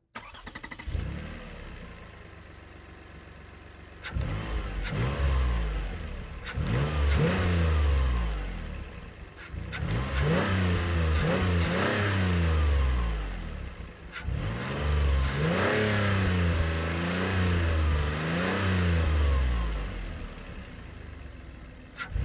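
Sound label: Car Highlander Outboard Revs Med; 2005 Toyota Highlander V6; Cars; Vehicles; Big Room Sound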